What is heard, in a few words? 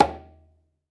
Conga High Slap; SPD One Sounds